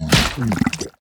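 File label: tower tongue shoot; crocs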